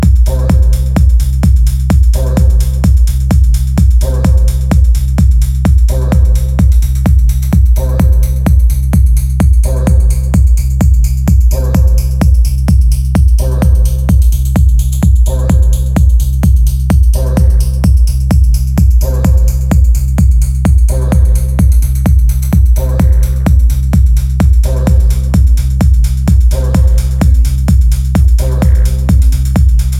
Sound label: Deep French